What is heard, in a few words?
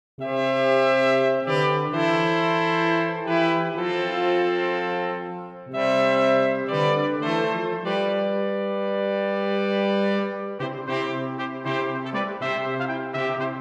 Intro & Courante, Brass Quintet; Suite From Terpsichore; Atlantic Brass Quintet; A Musical Voyage